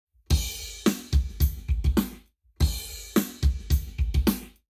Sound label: Lab Wet KEMAR Drums 0to360deg